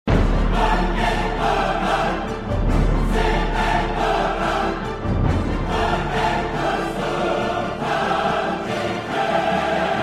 Suspense Full

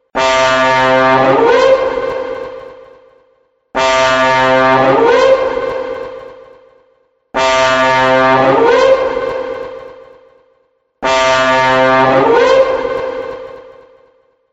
alarm; viking

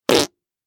Fart sound effect

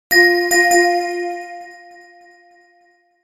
bell message alert tone

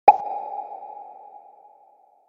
pop notification

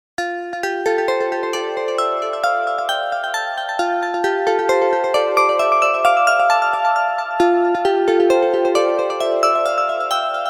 gentle wake up alarm sound

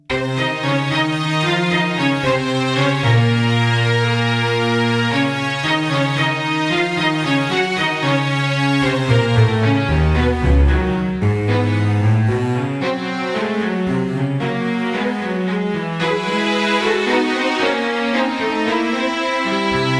desert love caravan music

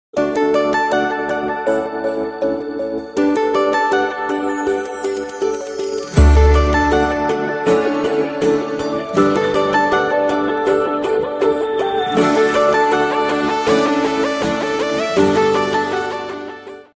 soft love tone

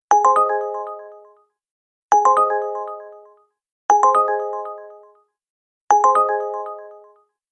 alert sound; uber

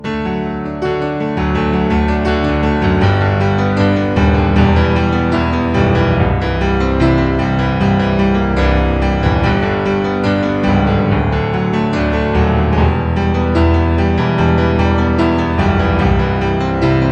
piano wish ringtone

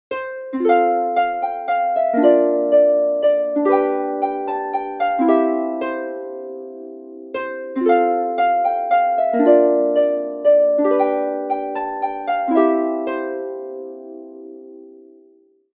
gentle harp melody ringtone

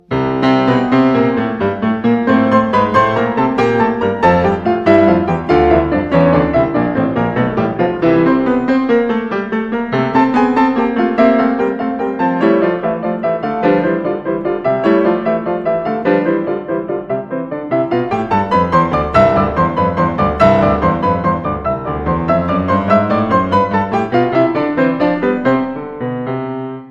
sonata no 22 in f major ringtone

